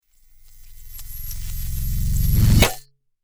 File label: Science Fiction Magnetism Electrical Metal Impact Super Power; Sci-Fi